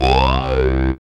SYN, Roland; Sound & Vision - Gigapack II; Morpheus

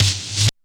REV SNARE; Back In Time Records; Big Bang

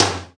SPD-8, DIGITAL; 90 s Sample CDs; Sound & Vision - Gigapack I CD 1 (Roland)

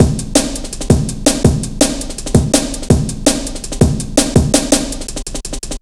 165, drum loops, drum & bass; Total Drum&Bass

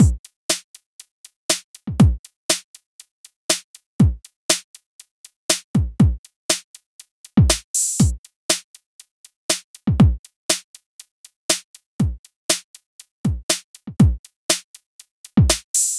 Mixed Beat, Chillout; Sonic Foundry (Sony Creative Software); Afterhours EDM; Chillout Service

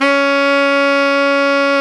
SAX TENOR; Roland LCDP07; Tenor V-sw; Tenor 3way

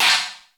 CHINA CYM; Crypton - DrumBase5000

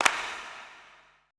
E CLAPS, ETH PERC

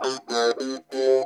NOTE BOX, Funk Guitar; 90 s Sample CDs; Zero G

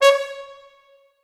C#4 R, Orchestral Brass

